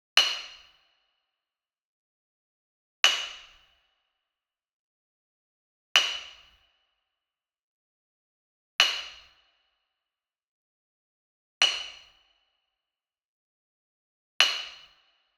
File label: Wood Percussion Wood Block Traditional Hit Medium; boom library; Cinematic Strikes Construction Kit